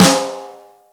ambient acoustic snare sound f sharp key

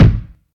tight kick one shot d key

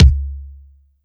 reverb kickdrum c sharp key

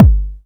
rich lows steel kick drum g key

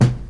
mid range bass drum b key; Duration 0:01; Channels Mono

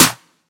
juicy steel snare drum sample e key

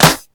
studio steel snare drum sound g sharp key

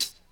old school dark tone hihat sample B key

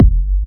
steel kick drum F sharp key; kick drum samples

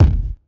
low tone kick drum sample f key

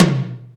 high tom single hit c sharp key

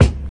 mid-range bass drum one shot c sharp key

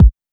natural steel kick drum b key; Duration 0:01; Channels Mono

